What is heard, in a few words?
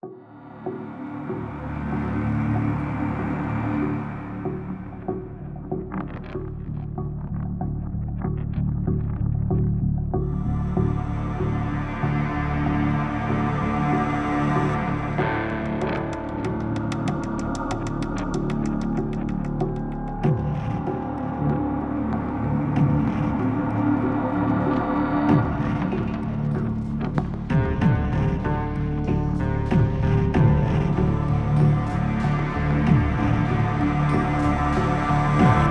Industrial Suspense; marz tactical base defense windows gamerip 2019